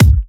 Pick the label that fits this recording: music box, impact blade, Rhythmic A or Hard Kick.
Hard Kick